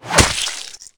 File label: monster claw; combat; CosmicRage